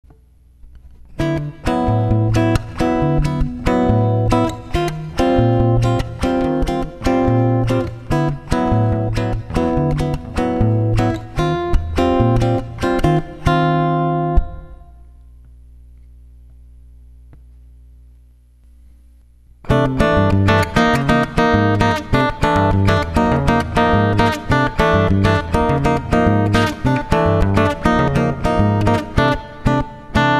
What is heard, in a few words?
bossa